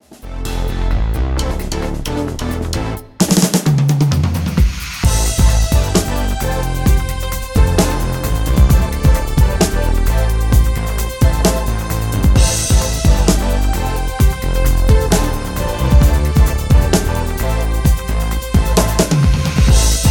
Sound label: Drum Kit; Grades 7 8; Trinity College London Drum Kit 4